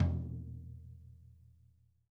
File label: tom, drum; 90s sample CD